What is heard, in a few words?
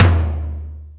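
Amb Lo Clear, Alesis D4 drum machine tom; 300 Drum Machines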